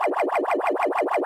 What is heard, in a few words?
shell green follow; tf2ware ultimate; mariokart